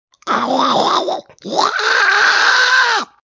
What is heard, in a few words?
goblin sounds